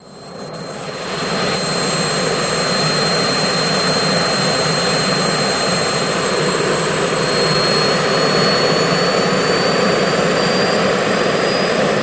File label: Jet Heli Idl Lift Off, jet ranger; Jet Helicopter, Idle and Lift Off